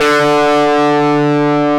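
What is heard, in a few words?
D2 distortion guitar; AKAI S-Series CD-ROM Sound Library VOL-8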